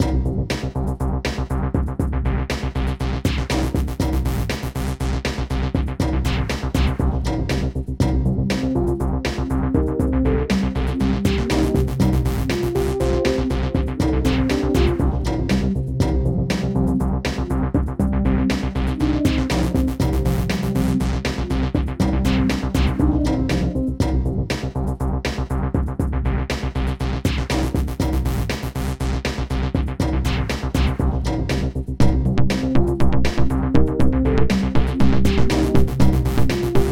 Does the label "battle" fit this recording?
Yes